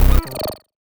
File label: Glitch Sound